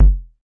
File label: kick, drums single hits; iElectribe Kicks